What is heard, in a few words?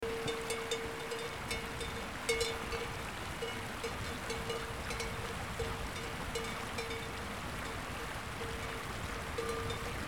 Ding Dong